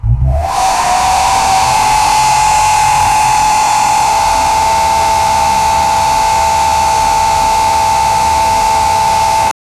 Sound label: engine